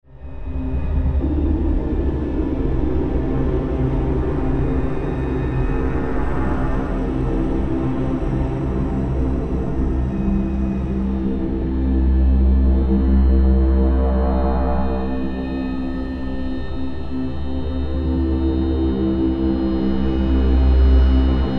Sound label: RED DEATH DRONE